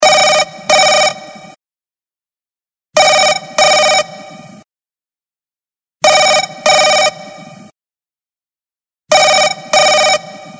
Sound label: blackberry curve 9320 tring tring, Ringtones